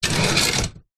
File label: office metal wire tray drawer slide